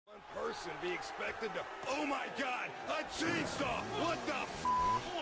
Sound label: chainsaw; saints row the third